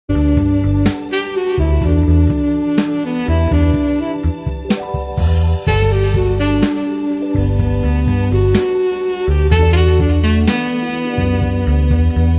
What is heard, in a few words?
Sax